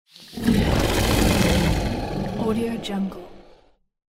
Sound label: dragon roar